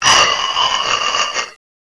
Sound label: alert